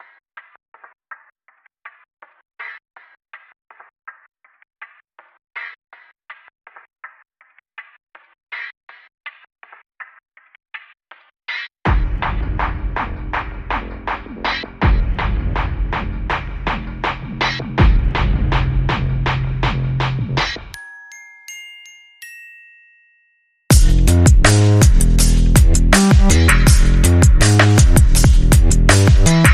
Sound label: Groove & Bass No FX